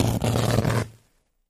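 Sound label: Electric Arc Short Circuit; arcs & sparks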